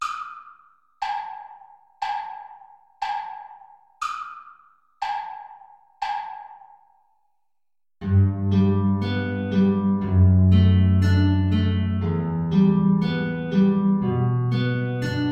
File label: down the salley gardens play along